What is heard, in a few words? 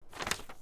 Page Turn